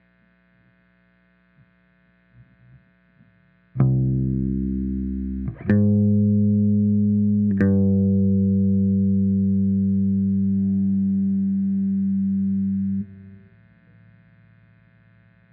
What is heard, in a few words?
bass; spinebashed; Bridge; 118bpm